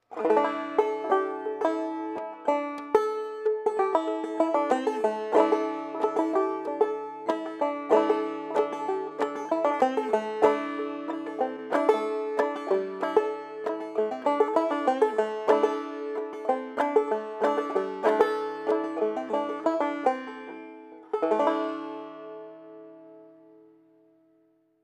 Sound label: openback banjo